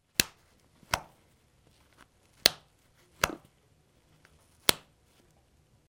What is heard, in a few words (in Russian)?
zvuk zaklepki